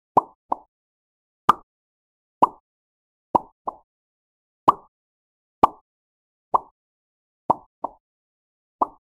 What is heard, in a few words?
plop jump into pudding